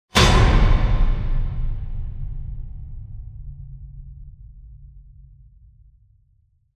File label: Insanity Hit; Gothic Storm Music